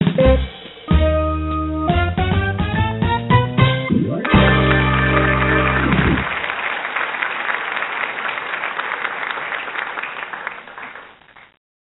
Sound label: Jingle Success Normal; Neighbours from Hell; NDS